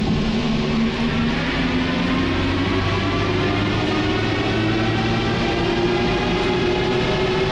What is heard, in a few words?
acceleration, Metro